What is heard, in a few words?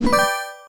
match